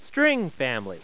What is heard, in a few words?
string